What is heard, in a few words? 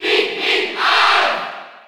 Cheer French; SSB4